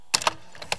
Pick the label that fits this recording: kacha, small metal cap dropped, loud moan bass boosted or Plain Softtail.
kacha